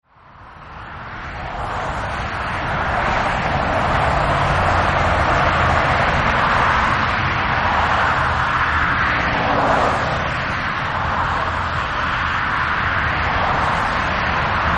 freeway night, Freeway Traffic On A Saturday Night, Cars Driving By; Close Perspective; 101 Freeway At Night; Traffic And Car Sound Effects